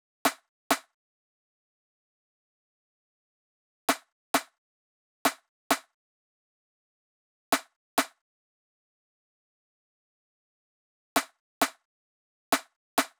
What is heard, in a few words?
drum loop 132 BPM rim; Loop Stems; Cymatics; DOPE DRUMS VOL. 1; Brunch Drum Loop